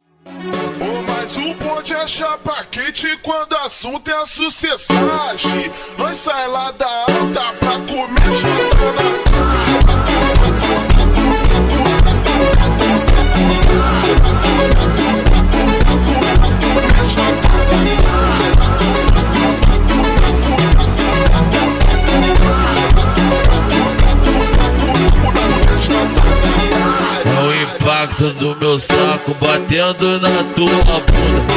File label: montagem vozes capacidade tiktok super slowed reverb